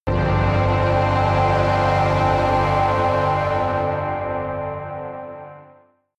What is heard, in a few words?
synth hover